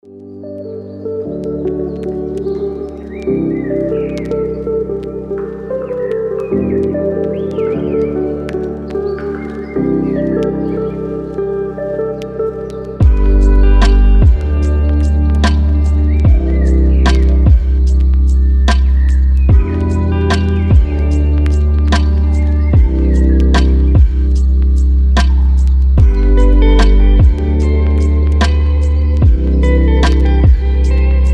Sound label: peaceful melody lofi